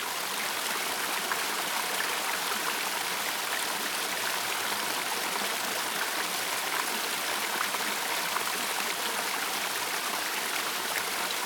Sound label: Small Water Fall In The Woods